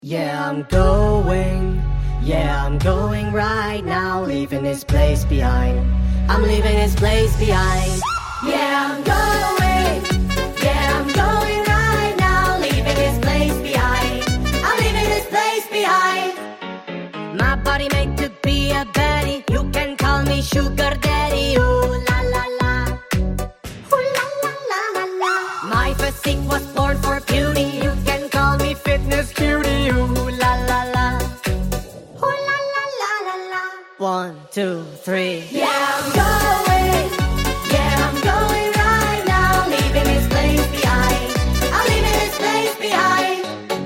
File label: sped up